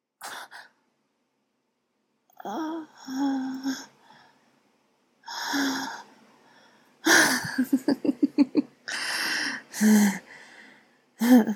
Going Deep While Edging Moaning Giggling Whimpering Tongue Out Edging Grunting; Goon Noises; F4A